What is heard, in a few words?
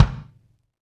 KIK, Real Kiks; Club-50 - Foundations Roland